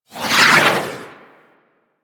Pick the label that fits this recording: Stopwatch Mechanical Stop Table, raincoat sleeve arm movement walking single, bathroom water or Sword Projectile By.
Sword Projectile By